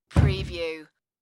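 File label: Cabinet Door Shut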